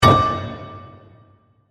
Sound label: Hit Metal Reverse, Reverb; Production Element, Imaging Element, Accent, Transition